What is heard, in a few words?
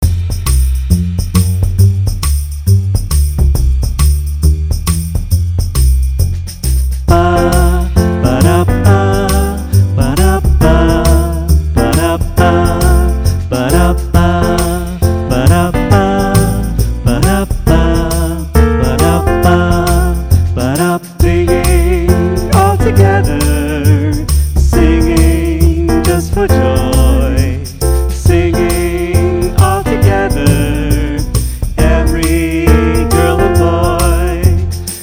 Singing all together